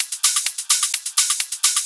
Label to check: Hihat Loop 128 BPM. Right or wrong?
Right